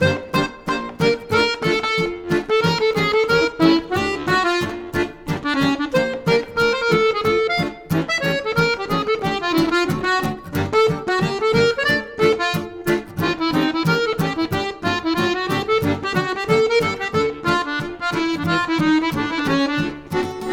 Dve Gitari, Jazz Manouche

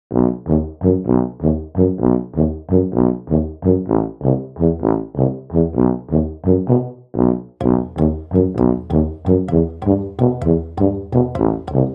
Underscore, Balkan Brass

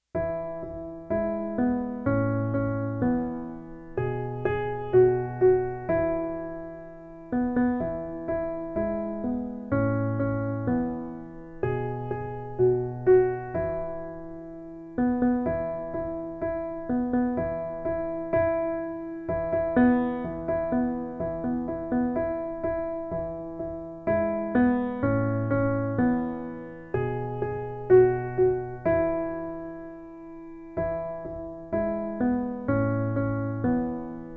Piano Version; Old McDonald; Tiny Tunes; Narrative Mind

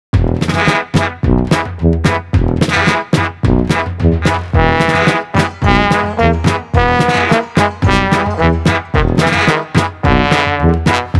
Balkan Brass; Narrative Mind

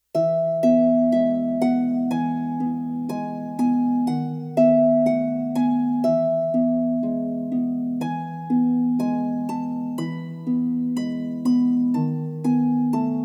Harp Version; Itsy Bitsy; Tiny Tunes; Narrative Mind

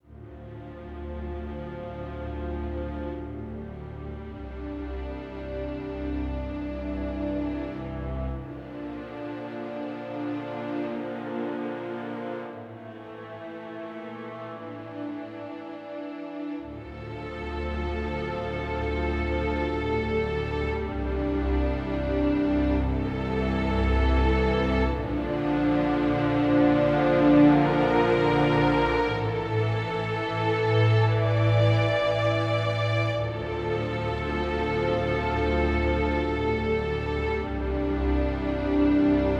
Whispering Leaves Underscore, Autumn Strings; Narrative Mind

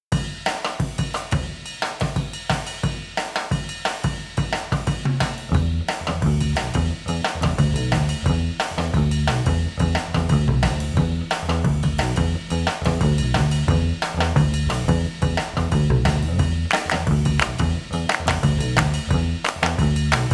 Underscore, The Swinging 60s, frantic; Narrative Mind

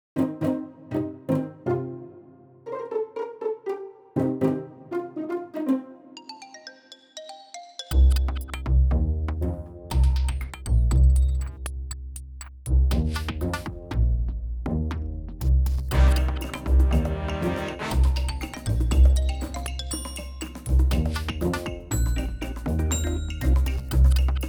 quirky; Narrative Mind

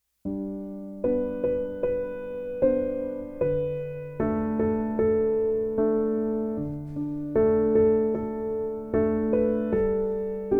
Piano Version; Hush Little Baby; Tiny Tunes; Narrative Mind

